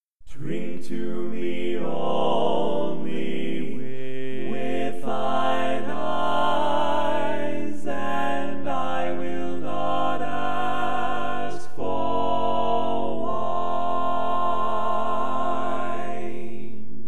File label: Tenor, Classic Tags